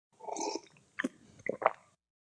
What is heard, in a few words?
Quick sip followed by a swallow.; Drinking; Sip then Swallow; Human